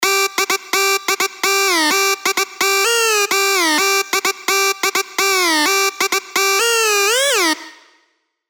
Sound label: dirty dutch leads; Spire; Take Control; revealed spire dirty dutch leads vol 1